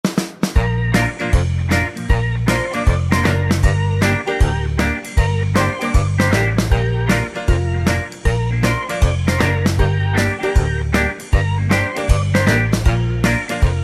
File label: Bb No Backing Vocals, backing tracks